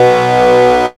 HARD B2; dubstep; Jack Sparrow; Deep Dubstep Progressions

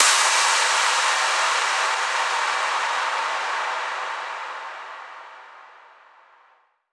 FX Impact; Mutekki Media; Vengeance Electroshock Vol.2

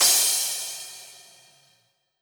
Crash, Cymbals

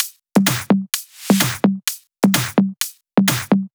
Loop, 128 BPM

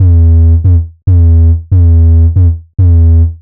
140 E BASSLINE, Dubstep; Loopmasters; The New Sound Of Dubstep; BASS LOOPS

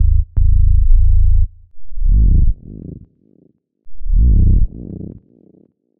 Bpm140 Bass Loop Am; Dukha; Prime Loops; Dubstep Illusions

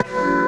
B STAB, funky; Loopmasters; Funky Underground Vol 2

